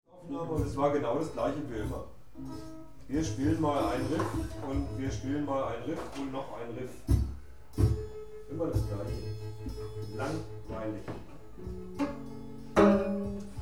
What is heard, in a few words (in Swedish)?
riffen